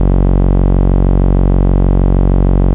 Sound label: Root F#, Bass; Vengeance Samples